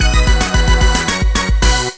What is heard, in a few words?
Game Over Trip; "Game Over" jingle (Balloon Trip mode); zelda